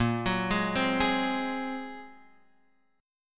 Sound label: A sharp 7th Suspended 2nd Sharp 5th A sharp, Guitar Half Step Down